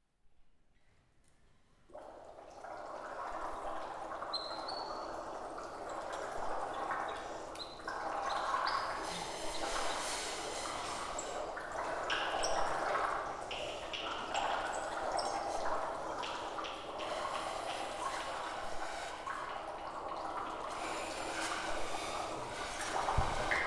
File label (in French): ambiance mare